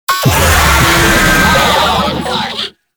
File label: Player Spotted, Vocals; Warframe; Proto Infested; Babau